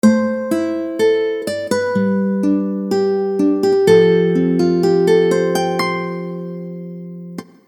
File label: RingTone